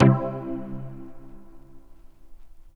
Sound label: DX200 House Lite Vinyl Synths Cmaj C2; vinyl chords